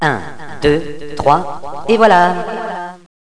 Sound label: kit; Villagina; ecole